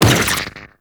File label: Proj Generic Whoosh; Warframe; Lizzie